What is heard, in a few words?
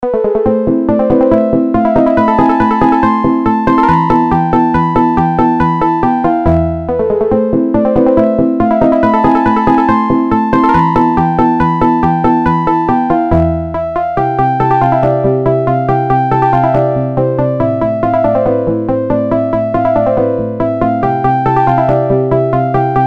Classical Harp